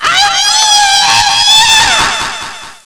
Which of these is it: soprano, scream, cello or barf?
scream